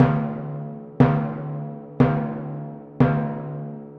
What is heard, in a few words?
Timpani, Orchestral, Rock Pop